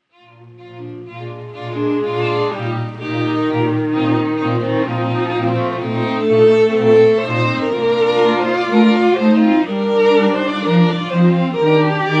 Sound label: string quartet; Hill Chamber Music; Sheep May Safely Graze J.S.Bach